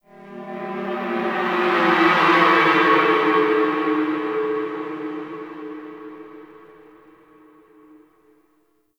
Sentient Texture Medium H; Warframe; Sentient Lair

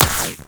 Shield Impact

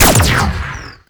Fire Shot; Duviri; Incarnon Cestra; Weapons

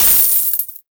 Fist Claw Coin Impact; Incarnon

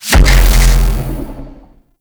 Beam Fire; Warframe; The New War; Erra; Abilities

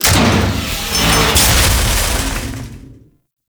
Outpost Prisoner Cage Open; Warframe; The New War; Narmer Outpost

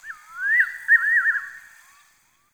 Bird Nightjar; Warframe; Ambience; Nineteen Ninety Nine

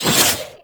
Whoosh, weapons; Broken Frame Warfan

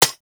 Bullet Impact Generic Metal; Warframe

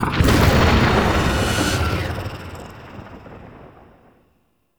Zariman Corruption UI Fracture Open; Warframe; Missions

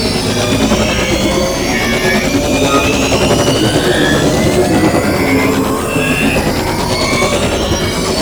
Charge Loop; Liset; Warframe; Awakening Remaster

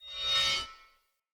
Player Sphere Polearm Whoosh Accent Heavy; Weapons

